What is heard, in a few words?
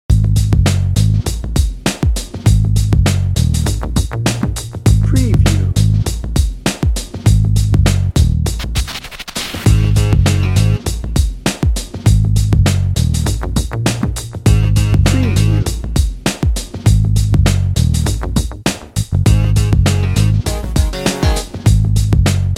hip hop bounce bed